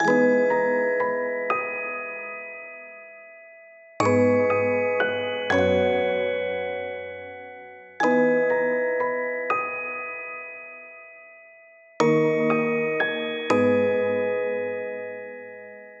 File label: Mallet Loop 120BPM G#min; 99Sounds; Monosounds; Music Loops; Instrument Loops